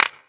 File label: Clap; Equipped Music; Loungin House